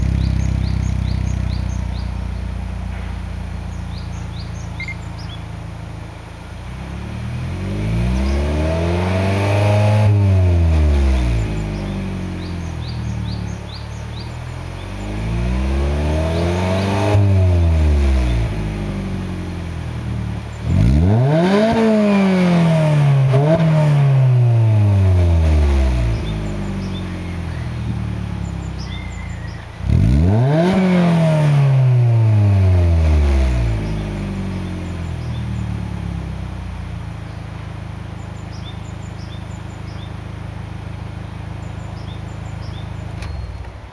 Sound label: 2.2 JTS, tuneup